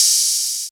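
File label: hi hat open, Yamaha TG-500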